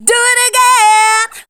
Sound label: voice spectral, ly short